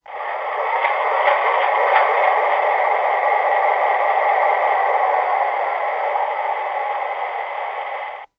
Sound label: engine, modellbahn